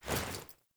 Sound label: Foley Action Default Jump, Foley Default Clothing; Characters; Player